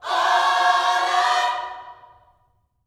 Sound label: OH LORD, Vocal Planet Gospel